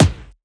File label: Percussion; FLPRO